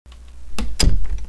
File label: Door click; Wario Gets Straight